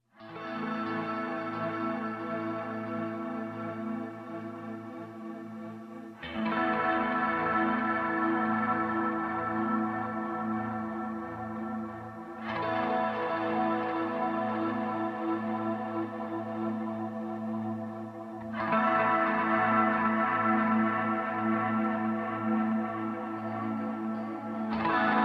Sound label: Guitar Only